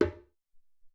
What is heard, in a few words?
Djembe Hi